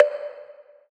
Temple Perc; trap; Percussion; Cymatics; Trap Starter Pack

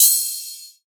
Ride; Cymbals; Ghosthack; Color Bass Essentials

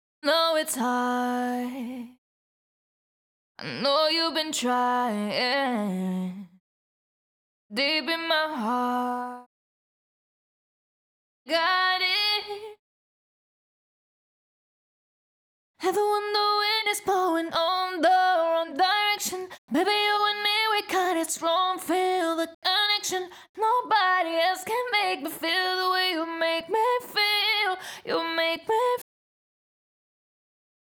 CLEAN DUPL R (EMaj) BPM123, Pop Vocals, Acapellas; Ghosthack; Ultimate Pop Vocals; Rain

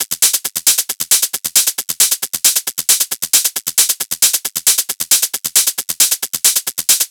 Hat Loop 135, Techno; Ghosthack; Techno Sounds 2025

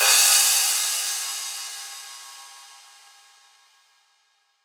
Crash; Cymbals; Ghosthack; Color Bass Essentials

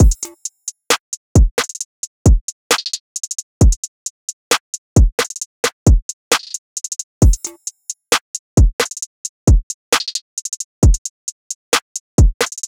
Drum Loop 133 BPM; Enemies; Cymatics; Mystery Pack Vol 4

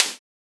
Snare - Twisted Spins, Psytrance; Snares

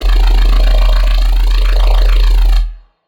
Bassline, Dubstep; GhostHack

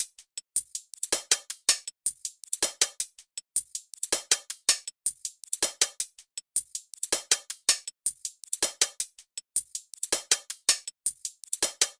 Drum Loop - 160 BPM Hihat, future bass; Drum Loops; Cymatics; GHOST (Beta Pack); Acorn

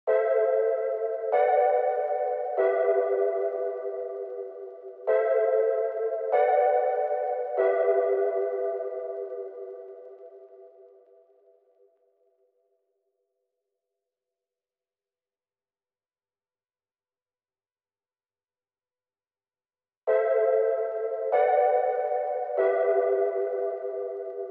96 BPM E Min High Chords; Cymatics; Infinity Melody Collection; Avarice

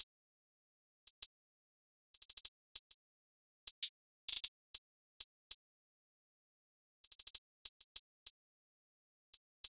Drum Loop, 98 BPM Hihat; Loop Stems; Cymatics; Vibes II Drum Loop Collection

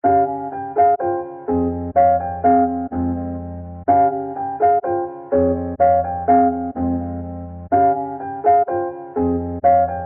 125 BPM F Min Piano, melody loop stem; Trap; Loop Stems; Cymatics; 2022 Melody Collection - Trap Pt 2; Suicide Doors

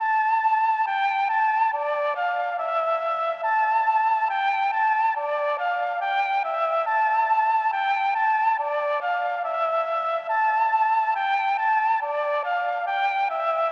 140 BPM D Min Mellotron Flute; Merciless

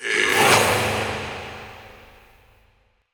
Processed Battle Shouts, Viking Vocal

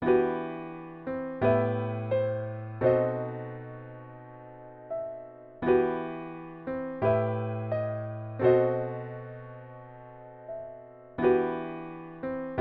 86 BPM E Min Piano; Cymatics; Gems Free Teaser Pack; Cymatics Gems Vol 9; Dreamers

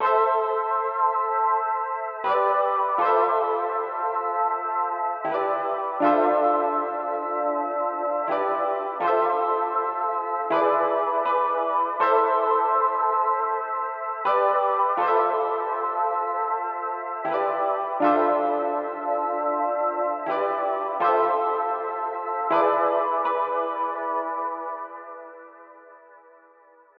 160 BPM A Min High melody; RnB; Loop Stems; Cymatics; Loyal; 2022 Melody Collection; RnB Pt 2

